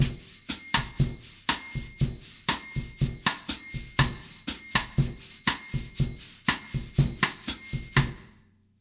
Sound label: 120 brush, brazil bossa; USB Soundscan; AKAI